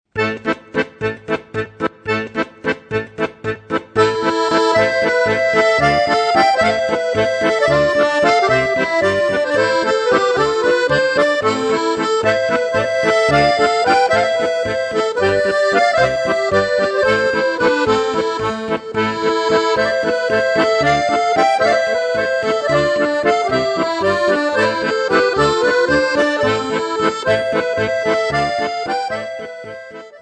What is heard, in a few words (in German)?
Griechenland, Accordion; Souliotikos